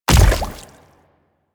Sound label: Step Water, Footsteps; Enemies; Warframe; Infested; Grey Strain; Splitter